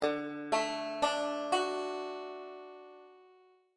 d chord, banjo; beginning 5 string

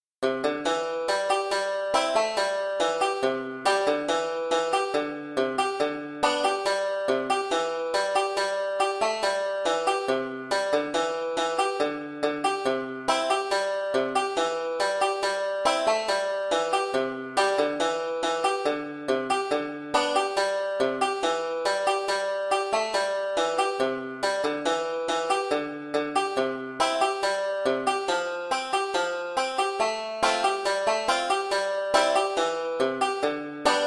solo c tuning, banjo; 5 string; oh susannah

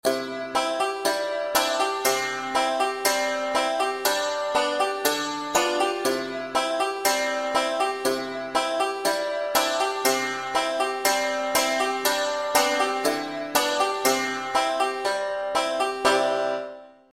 accompaniment, banjo; 5 string; aunt rhody

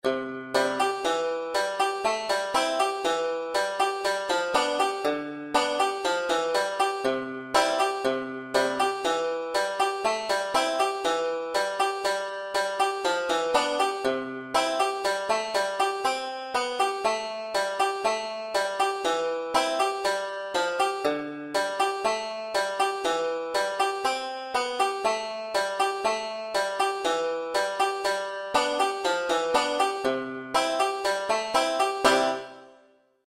banjo solo buffalo girls c; buffalo gals

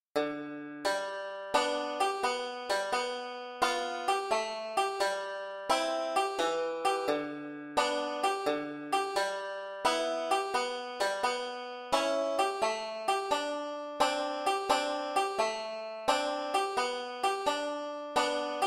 amazing grace banjo solo g c d em a7; beginning 5 string

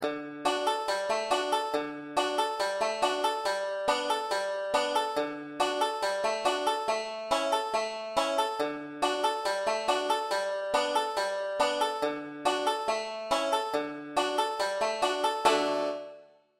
acc sans melody, banjo; 5 string; wreck of old 97

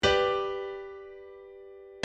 piano chord g; circle of fifths

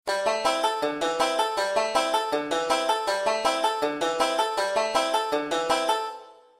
am hammer bass, banjo; beginning 5 string

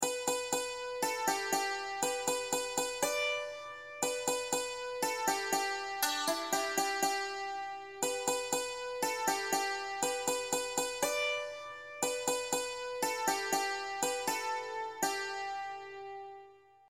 chorus melody only, banjo; 5 string; cripple creek

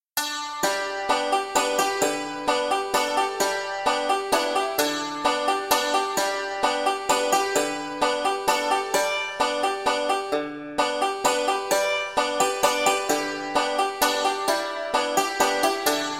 amazing grace banjo all g; beginning 5 string

